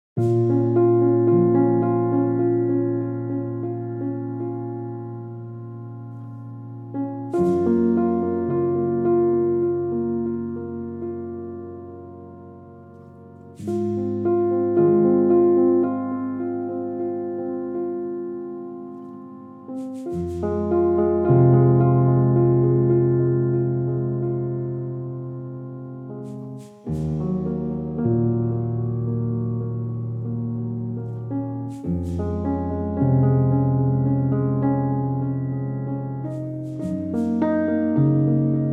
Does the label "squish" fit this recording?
No